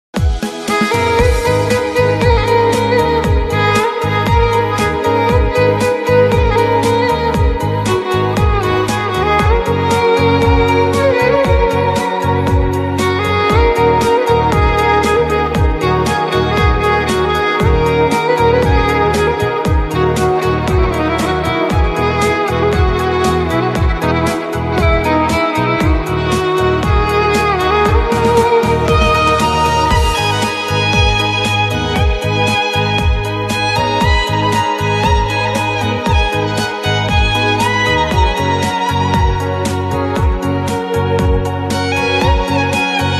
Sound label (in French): violon violon violon; غمگین; آهنگ های غمگین اینستا